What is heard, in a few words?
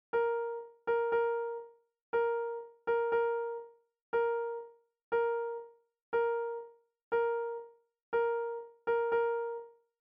T Rocks Rock